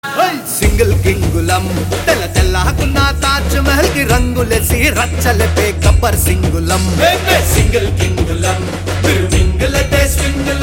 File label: Ringtone; A1 Express; Telugu